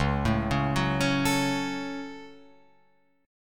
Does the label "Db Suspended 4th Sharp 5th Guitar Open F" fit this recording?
Yes